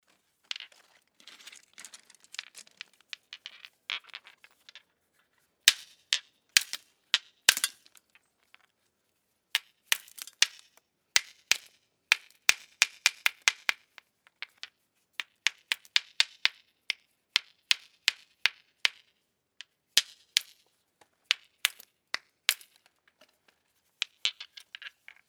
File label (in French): Le façonnage du biface